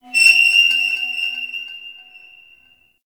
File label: High Metal Screech; Props; Warframe; Tau; Ambience